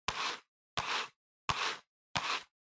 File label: PC Mouse Move Hard Pad Hit